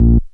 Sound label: tone; invaders